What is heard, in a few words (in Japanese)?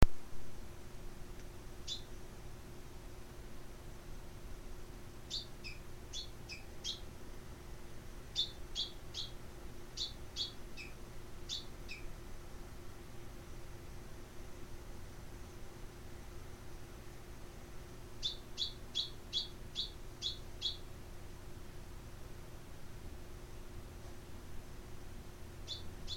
uguisu